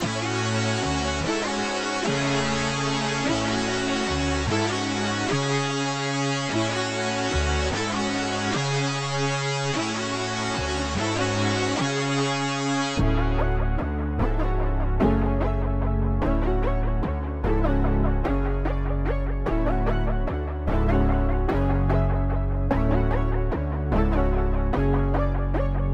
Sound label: 148 BPM F# Min Chords, melody loop stem; Loop Stems; Cymatics; 2022 Melody Collection - Wavy; Man On Fire